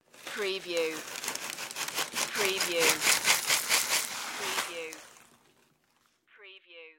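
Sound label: Shaken Cereal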